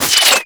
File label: Reverse Start; Weapons; Warframe; Tenno; Ink Rifle